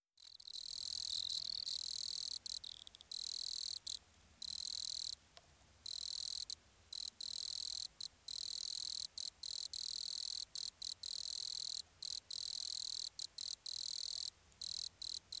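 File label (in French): grillon des bois nemobius sylvestris, stridulations; naturophonia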